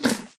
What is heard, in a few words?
eat, llama; Minecraft